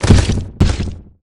death; venomancer

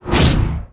melee ring; terrorblade

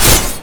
attack, weapons; dota; kunkka

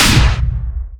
follow thru, large blade; dota

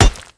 damage, building; physics

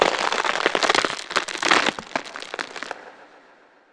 overgrowth target; treant

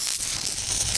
acid spray damage; dota; alchemist

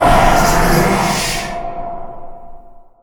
demonic purge damage; weapons; dota; shadow demon

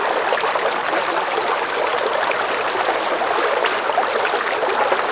river med lp; ambient soundscapes; dota

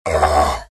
pain, vo; arc warden

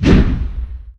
preattack; dota; axe

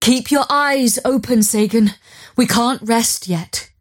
move pain; dota; mirana

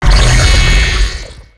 chakra return; weapons; dota; shredder

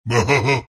alch laugh; dota; alchemist

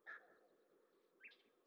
monkeys; dota

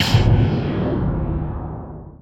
sleep dart damage; weapons; dota; riki

